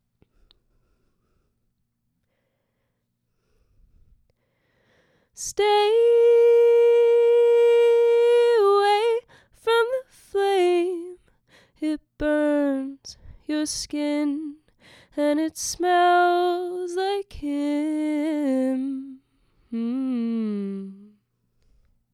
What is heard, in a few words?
vox